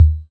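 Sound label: SC40 bass drum, Pearl SC-40 Kicks; DRUM MACHINES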